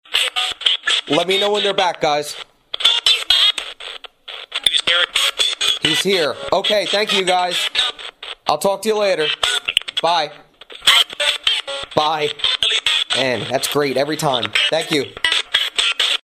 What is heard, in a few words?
he's back, sung, he's here, bye